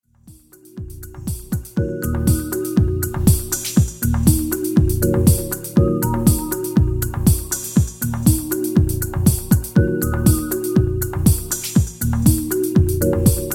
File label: drifting melody